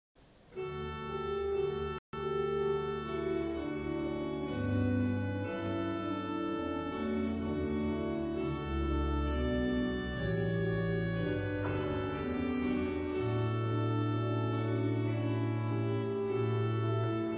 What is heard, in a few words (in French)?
Priere, Vielle; Ste-Marie